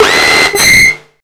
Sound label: NUCLEAR, Hard & Loud Techno